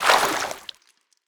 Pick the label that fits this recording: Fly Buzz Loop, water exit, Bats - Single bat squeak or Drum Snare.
water exit